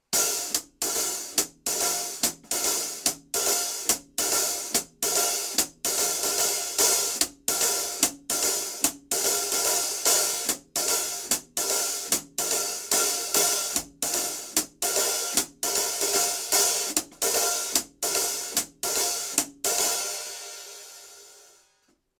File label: HiHat Swing open; Bosphorus 1322